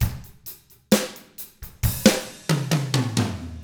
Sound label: SLOW ROCK, Drums; ILIO; Double Platinum Drums 1